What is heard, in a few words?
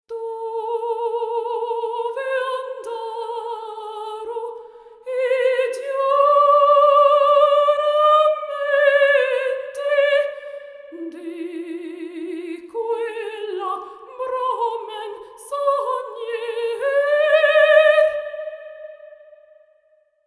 Opera Reverb